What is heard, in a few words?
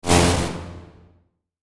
Whoosh Low Electrical; Blastwave; Whoosh Sound Effects